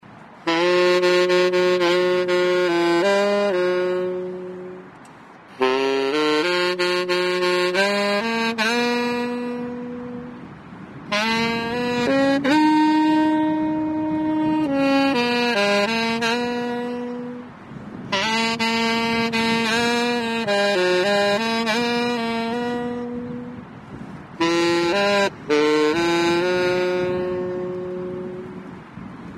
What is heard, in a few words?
saxaphone